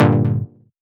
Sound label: Synth Cuts; Vengeance Samples; VES1 Instruments